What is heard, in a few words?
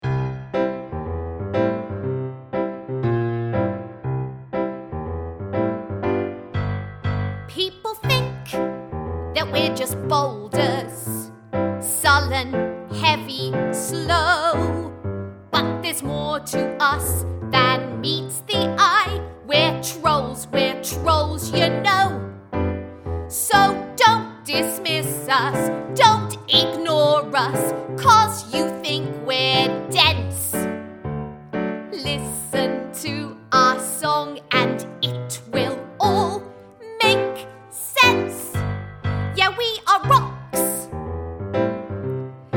w. vox